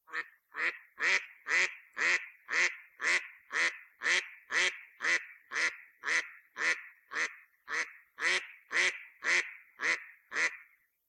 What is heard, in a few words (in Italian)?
Germano reale